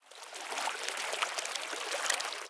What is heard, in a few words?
Fountain